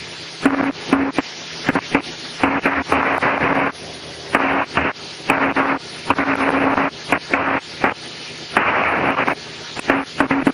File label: vhf distortion